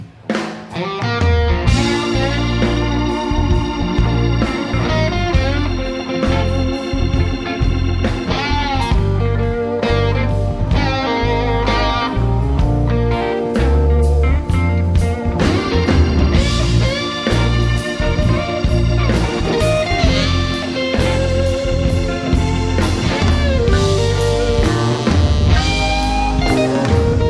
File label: jazz